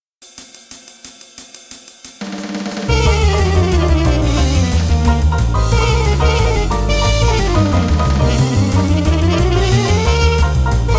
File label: mechanical chromatic; with motif